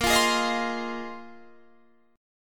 A sharp 7th Suspended 2nd Suspended 4th, Guitar Standard chord; strummed; 18,20,18,20,18,20; capo=2